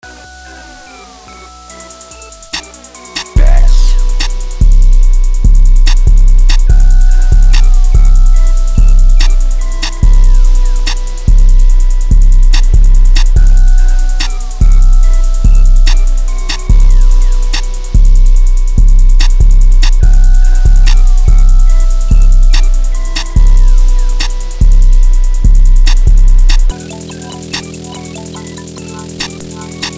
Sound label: beat